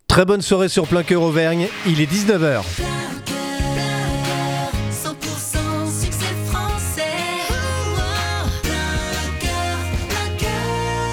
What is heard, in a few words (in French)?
TOP HORAIRE NEUTRE; PLEIN COEUR; MOULINS